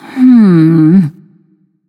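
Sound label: nonverbal thinking; smite; voicepack; izanami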